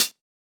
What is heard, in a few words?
Hit, Closed Hats